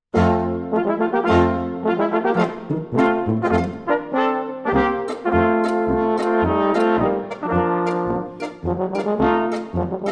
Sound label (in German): Marsch